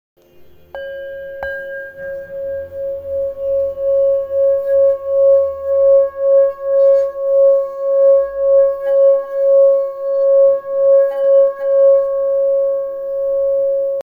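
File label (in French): bol, Bol tibétain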